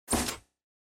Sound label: TV Turn Off Dry